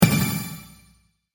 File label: Magic Power Impact